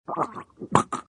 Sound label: Player Death Water; Acid Rising